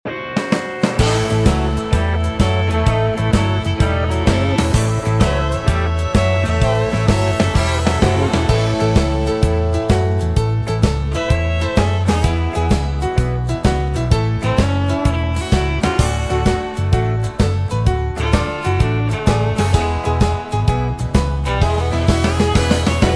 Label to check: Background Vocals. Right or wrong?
Right